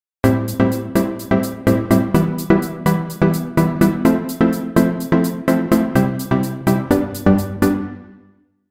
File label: Synth reverb